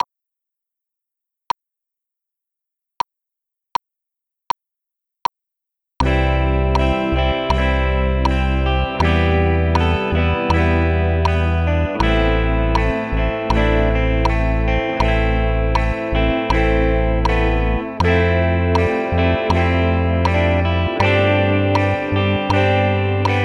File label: Candlelight Guitar